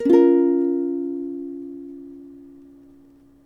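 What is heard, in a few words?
Ukulele B minor Strum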